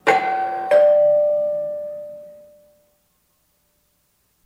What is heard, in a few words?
Doorbell Ring, Vibrations